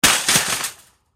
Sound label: Shopping Cart Remove